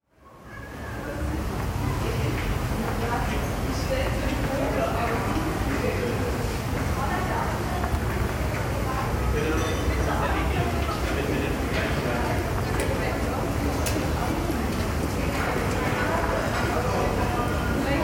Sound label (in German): Akustische Identitaet, car-free area of the city center; Soundwalk; The medieval architecture and irregularly laid-out streets give the space a strong auditory identity.; A Soundwalk for Klangspuren Festival 2025